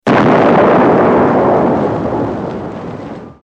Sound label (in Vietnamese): Âm thanh vụ nổ trong phim cũ